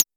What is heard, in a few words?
Voyager, Moog Voyager